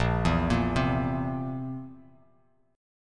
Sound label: A sharp Suspended 2nd Flat 5th; arpeggiated; JGuitar; 6,7,8,5